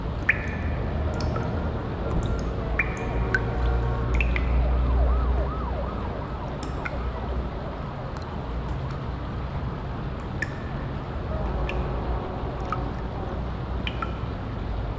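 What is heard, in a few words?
Ambience Dojo Sewer Loop; Fruit Ninja; Android iOS mobile gamerip 2010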